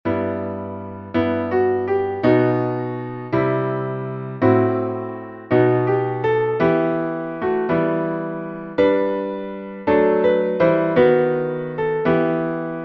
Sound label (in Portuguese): salmo instrumental